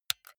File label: console controller xbox rb creaky press